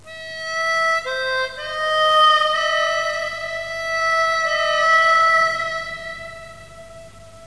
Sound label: armonica; deagle ~el~duelo v7b